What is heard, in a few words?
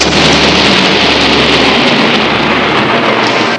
crashing water fall, nature; Sound Effects for Multimedia - Volume 1